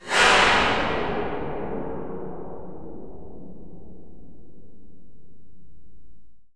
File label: AMBIENT; ENVIRN-AMB